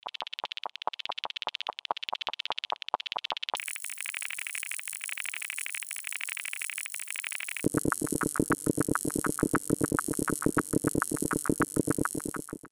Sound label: Vinyl Sequencer; UA Masterclass; Trip-Hop